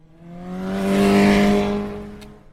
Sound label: fast bike motorcycle